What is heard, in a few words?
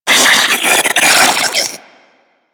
Infested Diseased Ancient Attack; Warframe